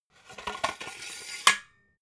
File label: metal scaffold pole retract; industrie-wirtschaft